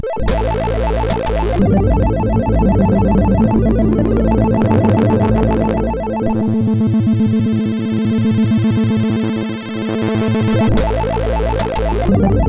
Menu, MOS6581R4

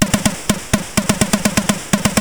Fast Sfx, CSG8580R5 sid

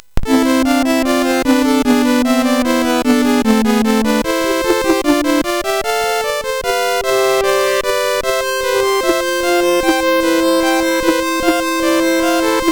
Classical, MOS6581; Classical 05; MOS6581R4

